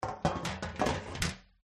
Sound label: baking tray place on counter